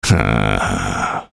dude sigh; postal dude

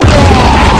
BLARGH; freak fortress 2; octagonapus

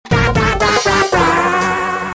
fail; TF2Ware; Warioman

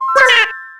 jump; isabelle

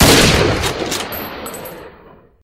sniper shot; hl2dm; oppressive territory

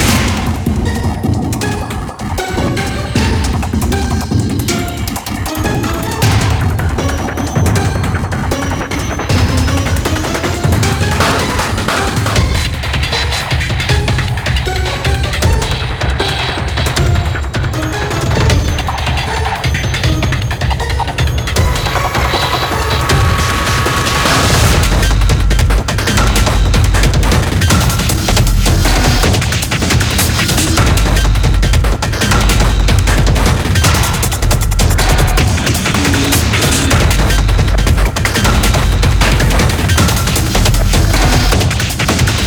chase; tf; slender; kfsmr huskbot

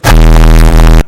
bass

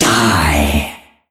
jump; pets; reaper

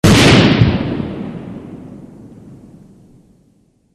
synth rifle

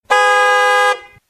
horn; kartsounds

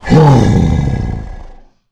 melee; agrunt

